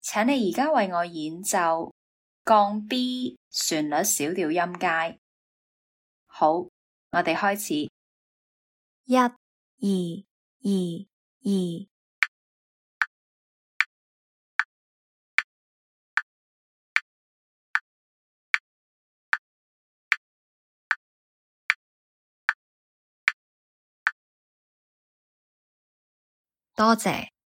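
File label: B flat minor melodic scale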